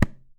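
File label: TABLA SLAP left; WorldSounds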